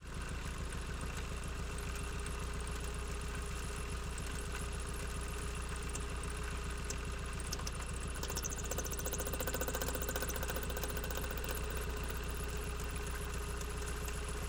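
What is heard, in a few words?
gaz 69 onboard idle steady engine gearbox; 1965; DPA4061; Pole Position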